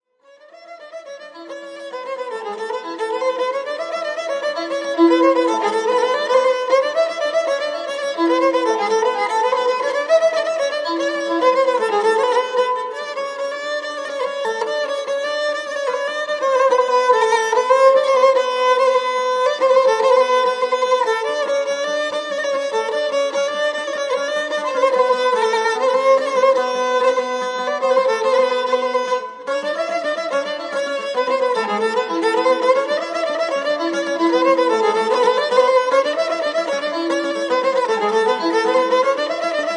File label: gudulka